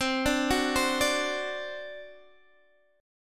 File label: C Suspended 2nd Flat 5th C down Guitar Open D